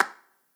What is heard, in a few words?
clap one shot; Pop; Drums; Slate; SD PASADENA Pop Sample Pack